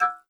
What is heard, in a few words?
button Hover; netent